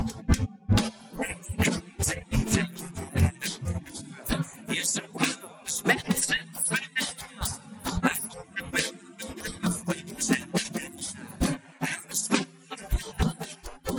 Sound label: snare; SiSEC11